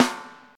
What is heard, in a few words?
reverb steel snare drum b key; snare drum samples